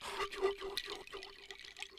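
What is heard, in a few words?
Music Village Calm Near; from dust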